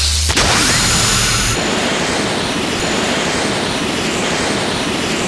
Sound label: speed up; evolution; Krillin